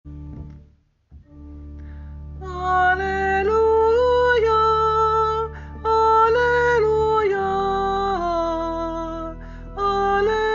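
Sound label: Gospel Acclamation; 3rd SUN Easter C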